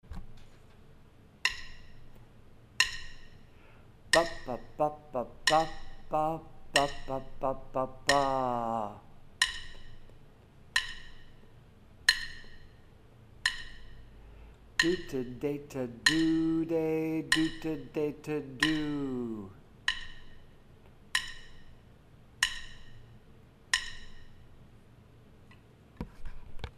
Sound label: Latvian Folk Song